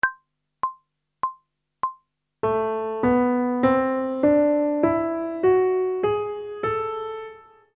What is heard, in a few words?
Minor Scale (Melodic) ascending; pop vocals; grade 3; Technical Work